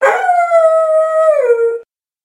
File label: monster wolf